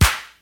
juicy snare drum sound d sharp key, acoustic snare tuned to the D# note